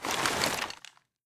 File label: Draw, weapons SVD